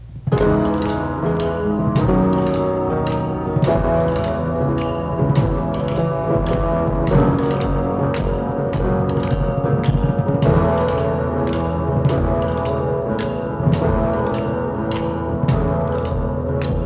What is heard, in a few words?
instrumental